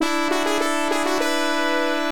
Success; Nobunaga's Ambition; Nobunaga no Yabou Zenkokuban; Genesis

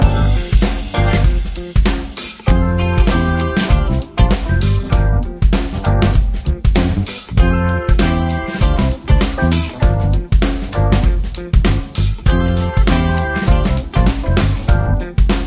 Menu Music Event Blackfriday; Pixel Gun 3D

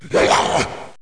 attack zombie

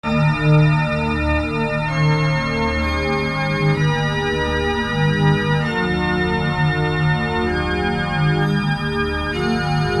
The Organ Room

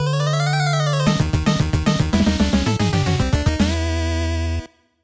Scene Clear; Teenage Mutant Ninja Turtles 3 The Manhattan Project; NES

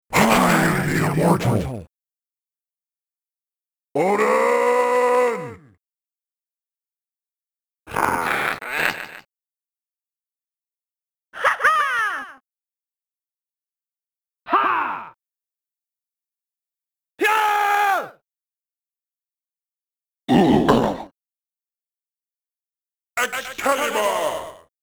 Victory Cries; time killers; arcade

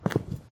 mat Walk

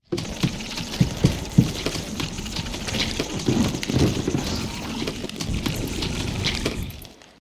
Swarm Inside Wall; silent hill homecoming; ps3

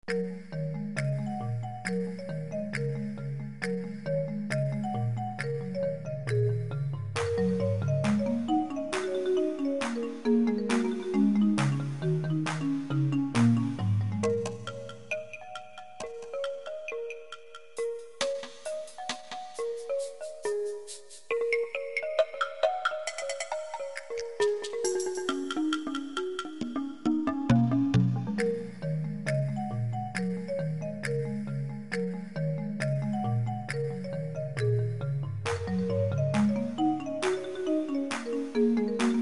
race; Galactic Civilizations II

